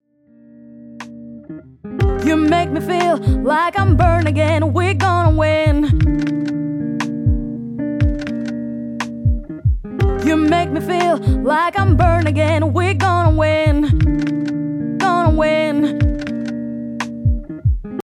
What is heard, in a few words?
vocal mix vocal rider; CLA VOCAL